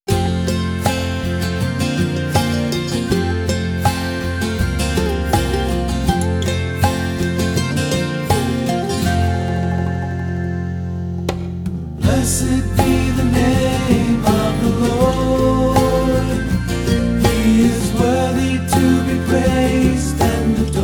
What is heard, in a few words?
acoustic worship